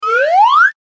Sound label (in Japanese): スライドホイッスル上昇